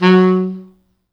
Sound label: F#2, Pop Brass; Best Service ProSamples vol.19; AKAI